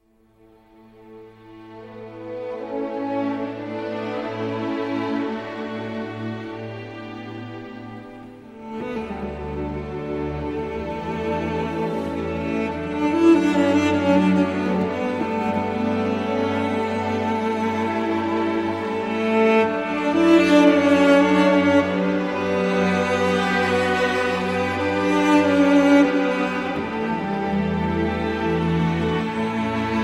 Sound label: Oboe; The Mission